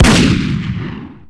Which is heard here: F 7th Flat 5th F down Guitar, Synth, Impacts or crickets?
Impacts